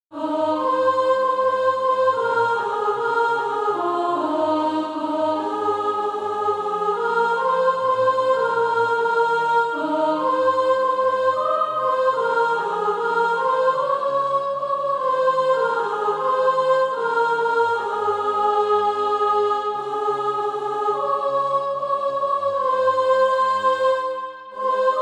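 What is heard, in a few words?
soprano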